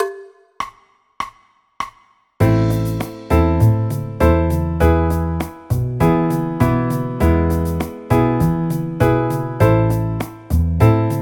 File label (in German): a moll akkordverbindungen